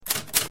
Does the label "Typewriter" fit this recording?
Yes